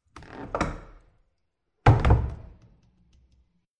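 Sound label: interior door; vnutrikomnatnaja dver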